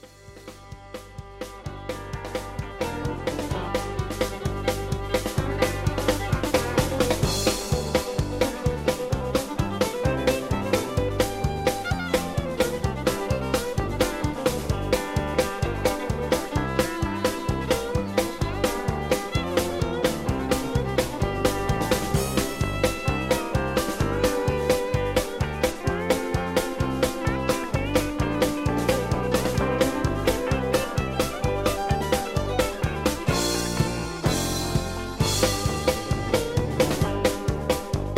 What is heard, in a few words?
Instrumental